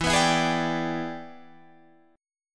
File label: E 7th Sharp 9th Guitar Standard, chord; E7#9 strummed; JGuitar